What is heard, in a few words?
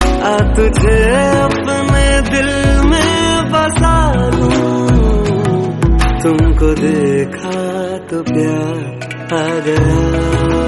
Hindi Song